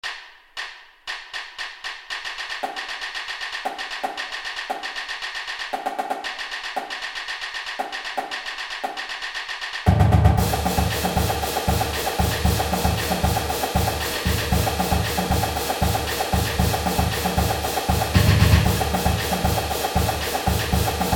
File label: Drumline, marching, pep music